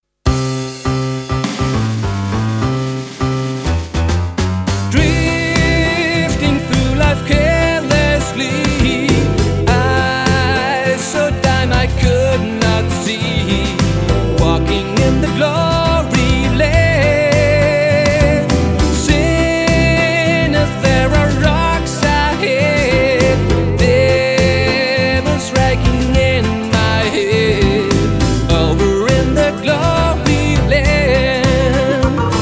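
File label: Gloryland, ukulele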